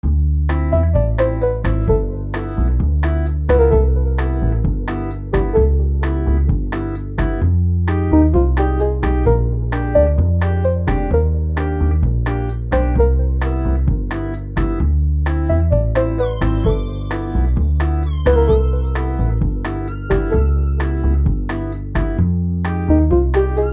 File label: cafe music